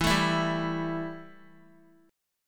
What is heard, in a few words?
E Minor Double Flat 5th E Guitar; Listen to Embb5 strummed